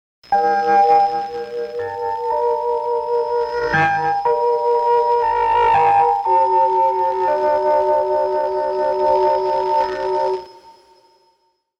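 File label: Radio Moscow Russia Interval Signal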